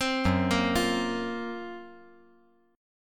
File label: F sharp Major Sharp 11th F sharp; arpeggiated; JGuitar